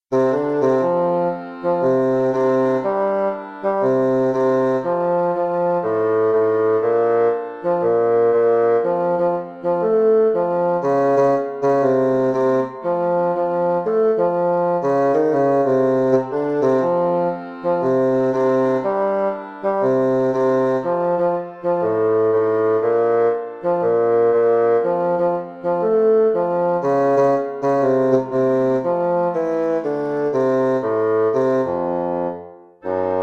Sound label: whoopee bas